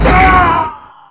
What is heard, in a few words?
DEATH; Wanted; players; male